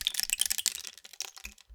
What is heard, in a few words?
Rain Stick, Percussion Samples